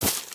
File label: bush, human step; Footsies